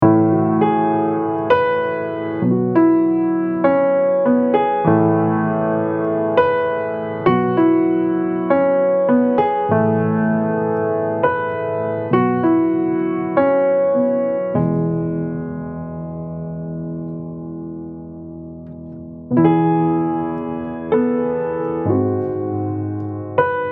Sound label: Piano Cover